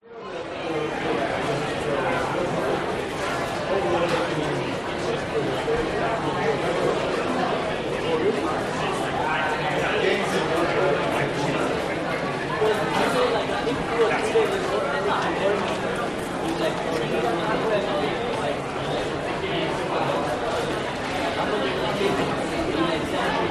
public places food court internal busy midday, Sydney; cbd australia